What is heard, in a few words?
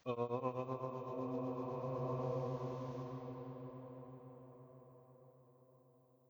ohhh Tremolo Reverb